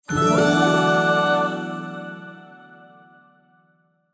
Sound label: win; 1casino